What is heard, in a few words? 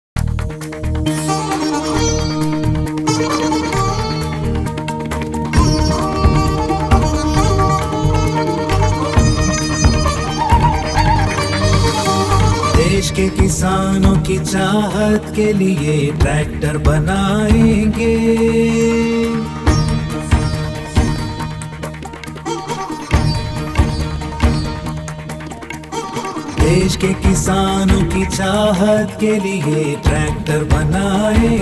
TAFE ANTHEM HINDI